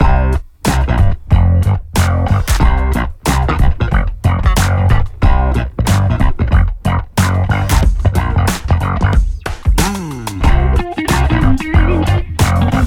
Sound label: Mellow Groove; ToeJam and Earl: Back in the Groove